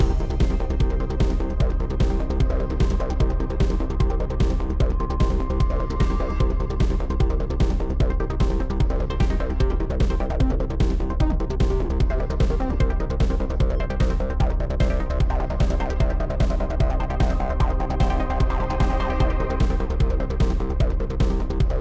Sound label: Chase, 150BPM